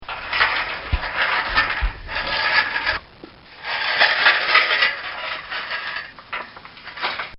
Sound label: Pasture Door With Metal Chain; Materials; Bobby Cole